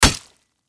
Battle Axe Strike Human Impact Hit Large Heavy Blade; Warfare